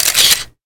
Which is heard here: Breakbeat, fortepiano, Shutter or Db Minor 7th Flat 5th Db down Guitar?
Shutter